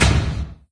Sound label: Bomb Sound; candy crush saga gamerip